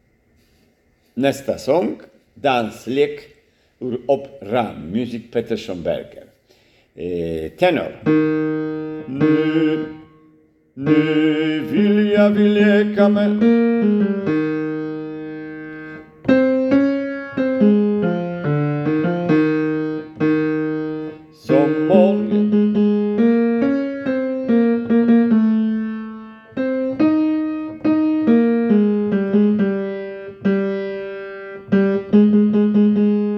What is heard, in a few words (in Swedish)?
Danslek operamusik tenor